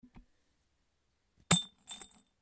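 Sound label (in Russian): звук разбивающегося маленького стакана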